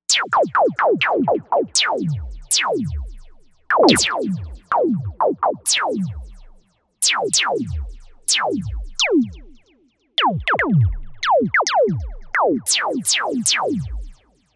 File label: Retro Laser, Novation UltraNova; Factory Patches